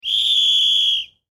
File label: Whistle Blow Referee; Sports; Whistles; Objects